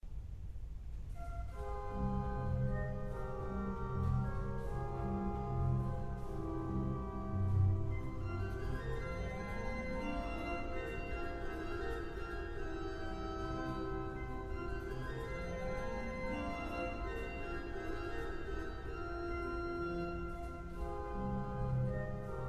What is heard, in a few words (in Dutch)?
orgelklanken; Bekende orgelklanken